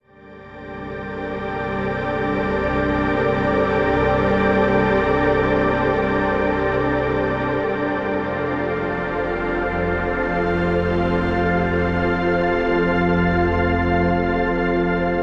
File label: Ethereal Pads - CMaj 126 bpm; MIDI Clips; Tonal; Atmospheric; Ableton; Factory Packs; Mood Reel